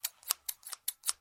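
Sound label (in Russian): обрезание звука ножницами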